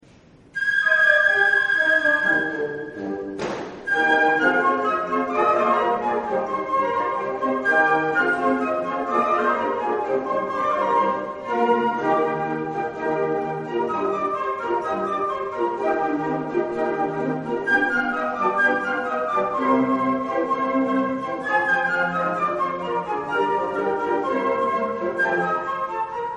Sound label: concert, flute